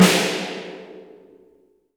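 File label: SN, snare; Best Service; Real Mega Drums VOL-1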